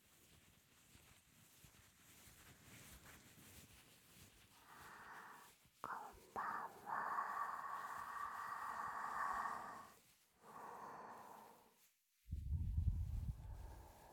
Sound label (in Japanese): 音圧マッサージ 耳舐め, ASMR